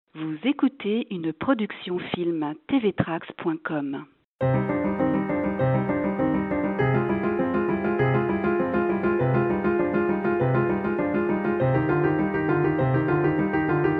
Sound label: Prelude Fugue in D major